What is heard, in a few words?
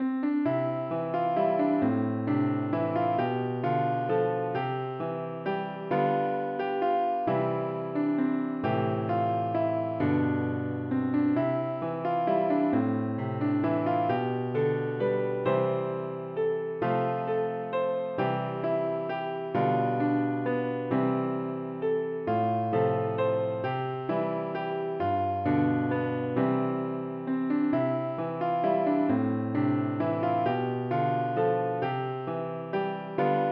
piano